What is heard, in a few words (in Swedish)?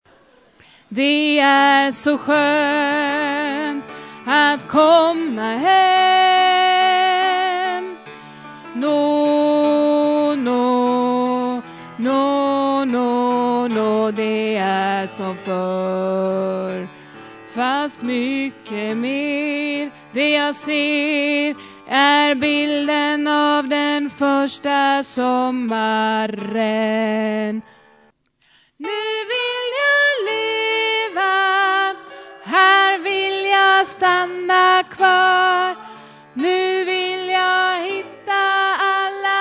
alt, stammor